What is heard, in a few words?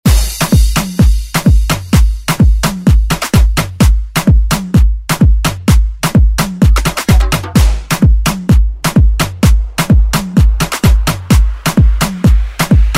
90s Afro Redrum